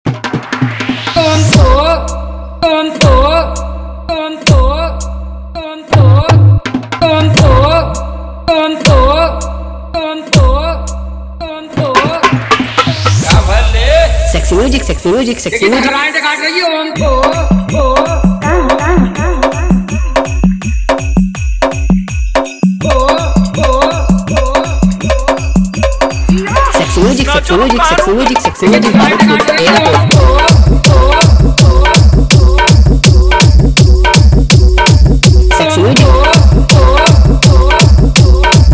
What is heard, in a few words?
Remix